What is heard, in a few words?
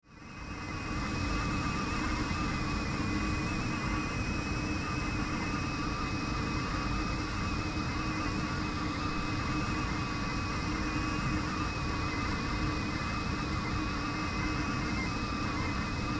Industry Propane Heater Run Blower; Machinery Sound Effects; Machinery (Sound Effects:Machines)